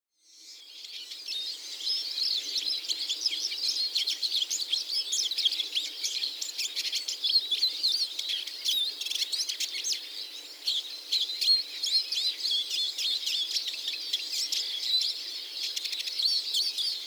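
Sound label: Eurasian Siskin; mahiwa; shiso; koe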